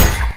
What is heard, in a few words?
parry; ultrakill